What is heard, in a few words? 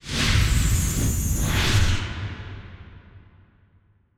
respin Logo; netent